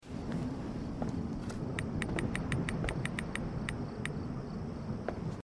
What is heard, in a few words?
code phone motel balcony hintergrund; Life is Strange 2; gamesoundopedia